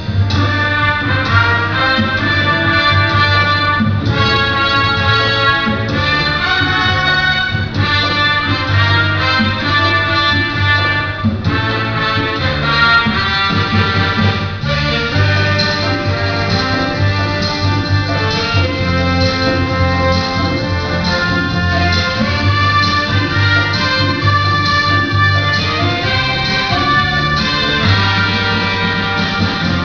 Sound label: suspense